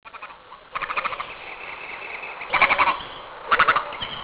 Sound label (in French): aigrette